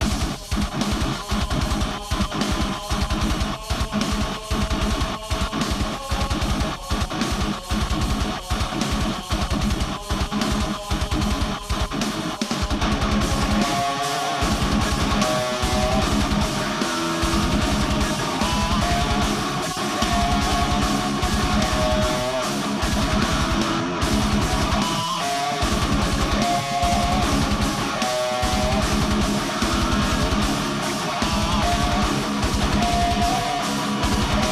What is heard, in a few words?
Mx Hatch Fight Lp